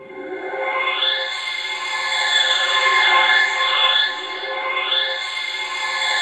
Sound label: FLUTTER, FLUTTERINGS; Zero-G Ambient 1